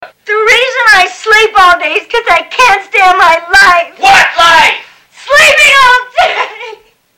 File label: Whining Mistress